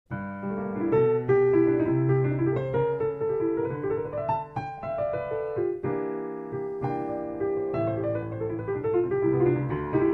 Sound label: piano intro